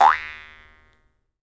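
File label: Jaw Harps, Pitched Percussion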